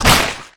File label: Blood Splat Gib; SFX Kit; Foley - Misc